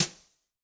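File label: Snare Brush; Yamaha DTXpress-II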